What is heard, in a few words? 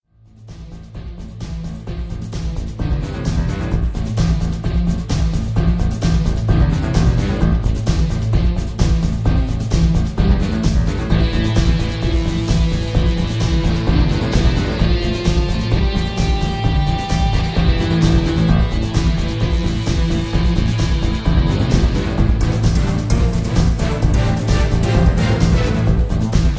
Waterfall Hard; Will Rock